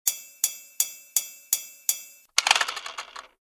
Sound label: Cymbals Monkey Broken; Don't Be Afraid The First Toy